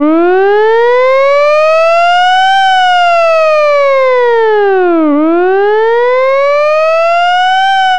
fire truck wail siren

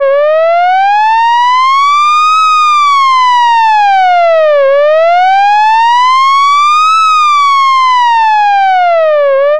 short alert siren

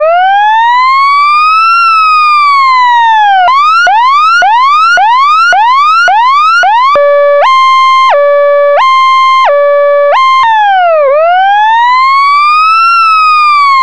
emergency electronic siren

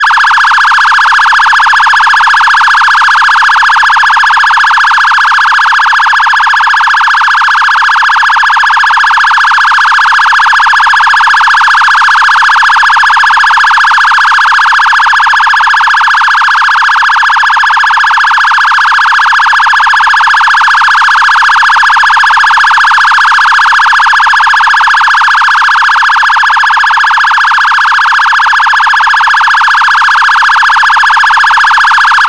electronic phaser siren

